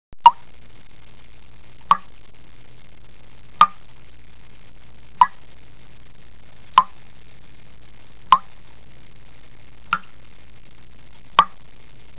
blood drip

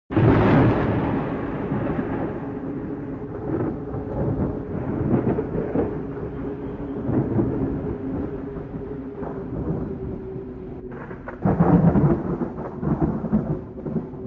Thunder Storm

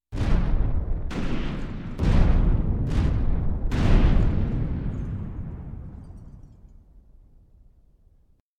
Godzilla's Footsteps

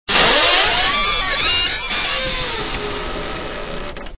Door Creak

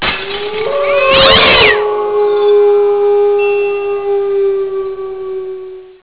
Cat Screeching and Wolf Howl

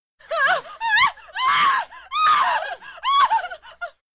Terrified Woman

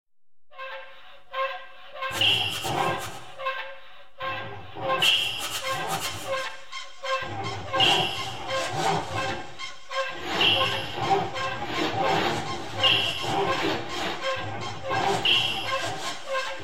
Aliens Boinking

